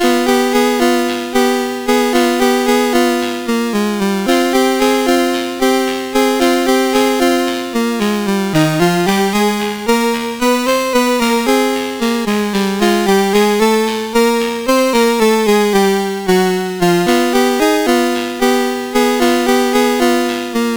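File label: MOS 8580 SID chip; CSG8580R5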